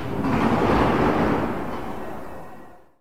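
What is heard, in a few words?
door close, Train; LT1938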